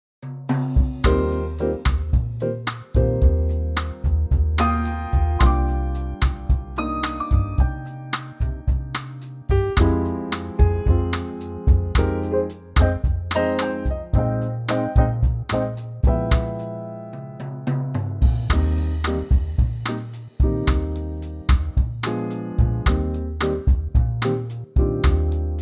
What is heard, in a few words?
Eb F bossa; key - Eb to F - vocal range - Bb to A (optional C)